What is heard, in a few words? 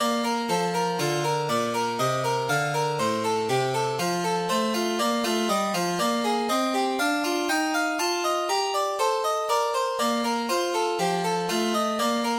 Partita, piano